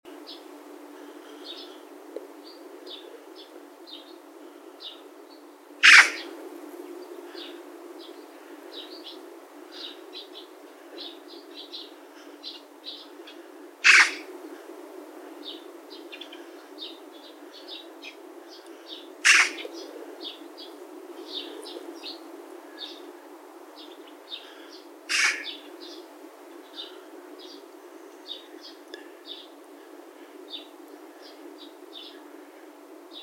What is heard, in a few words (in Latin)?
Pica pica; SETE